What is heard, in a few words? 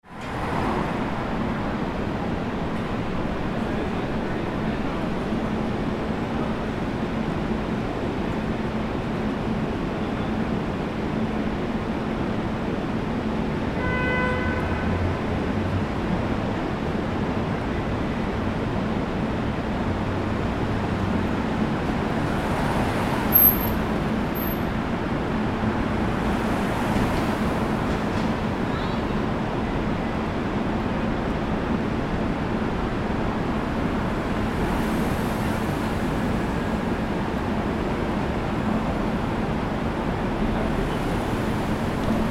Street